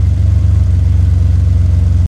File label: Veneno Idle